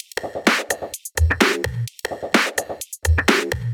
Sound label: House loops 128BPM; Vengeance Samples